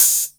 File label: Open HH, Cymbals